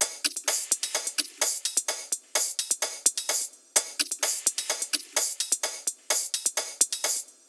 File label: Percussion Loop 128BPM